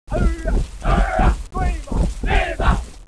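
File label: MILITARY ACADEMY; Caesar III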